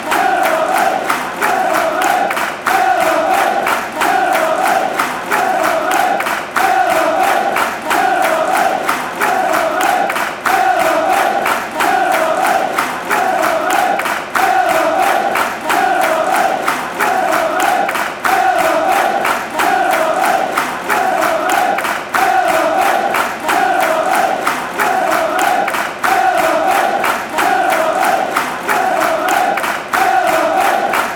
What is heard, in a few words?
yellow army, fan chants